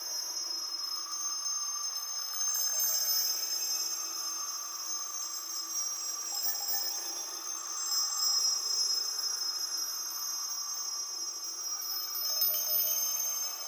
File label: amb portal